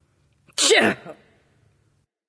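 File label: clear throat, female; smite; voicepack; hera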